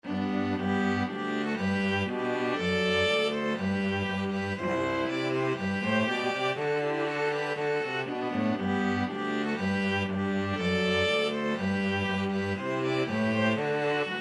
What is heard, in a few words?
te deum, string trio